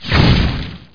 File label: fireball power cast; Heretic II demo; weapons